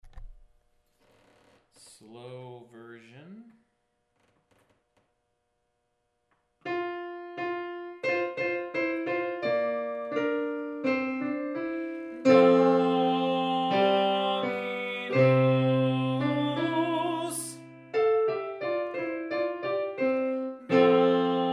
Slow Tenor; En Natus Est Emmanuel-Slow, M. Praetorius; Choral Resources

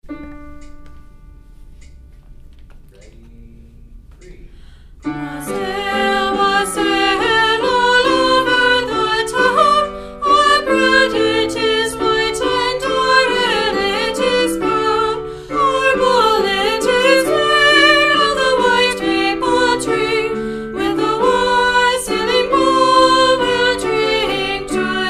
Wassail Song Sop; Gloucestershire Wassail-2nd Soprano, English Traditional

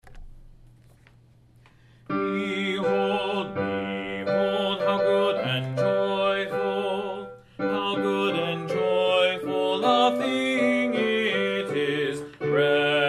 Men; Behold How Good and Joyful, J. Clark; Choral Resources

